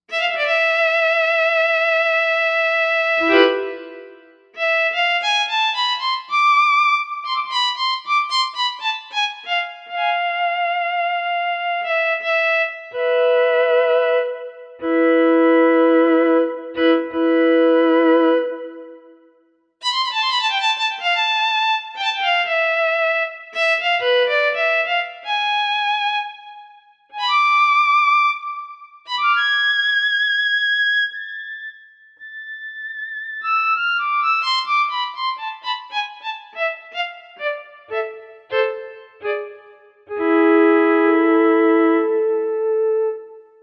Solo violin, Korg M1; Factory Patches

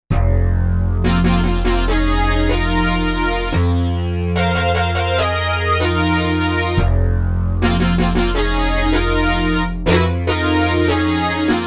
Power 4ths

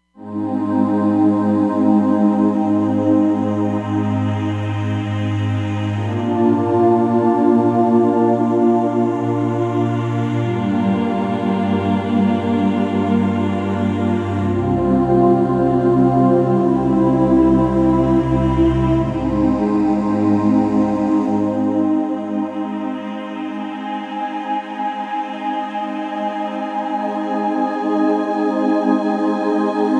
Roland Fantom XR; Morning Star

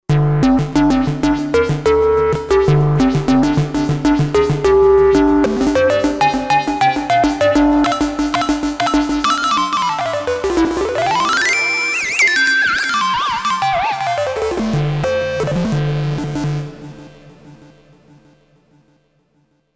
Ring Leader, synth

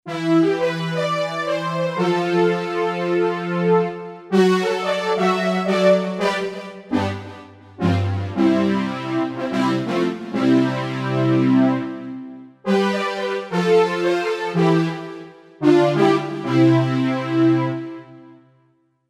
TS WIND, Roland SC-880; Factory Patches

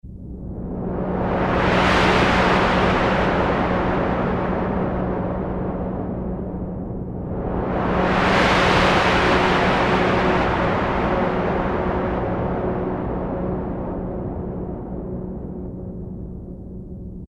Jet Plane, Korg Poly-800

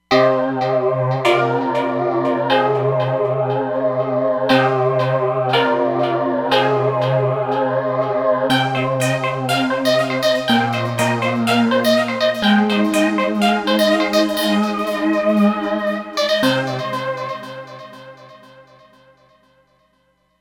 Phasing Arp, Roland Fantom XR

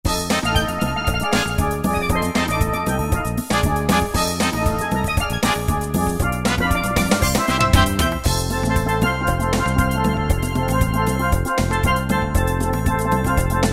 Soca, Yamaha QY70; Preset Styles

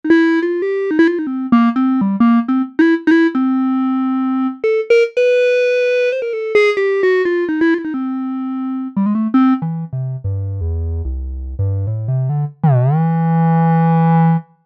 Clarinet, Novation UltraNova; Factory Patches

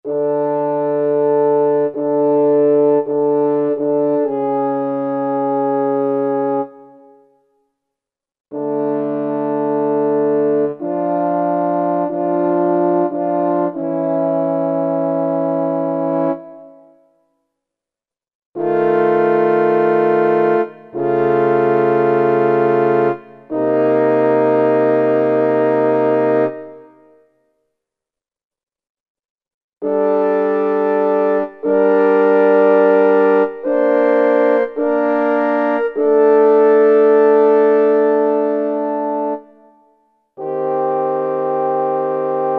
French Horn, Kurzweil K2000 Orchestral; Kurzweil K2000 Orchestral ROM

